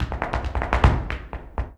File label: Flamenco, ZAPATEADOSOL